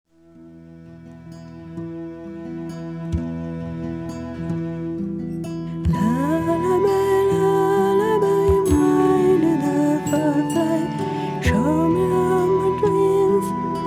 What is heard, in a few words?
Lullaby